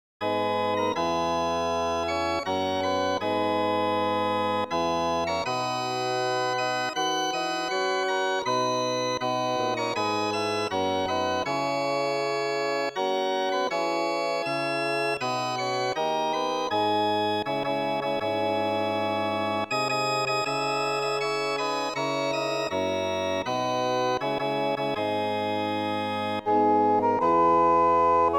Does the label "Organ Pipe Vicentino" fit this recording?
Yes